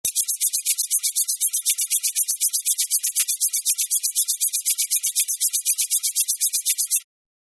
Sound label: Electric Bees